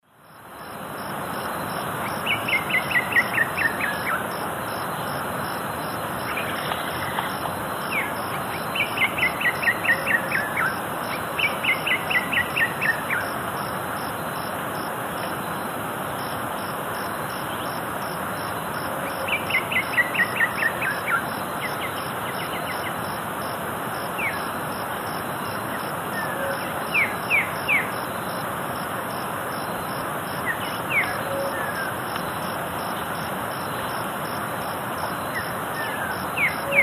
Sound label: Saltator coerulescens, alarm song and calls; S. America; Eastern Lowlands